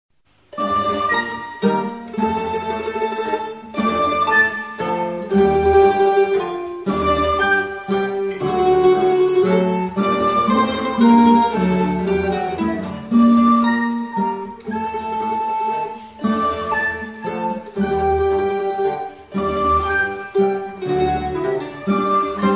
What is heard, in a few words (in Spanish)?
Musica Acuatica Andante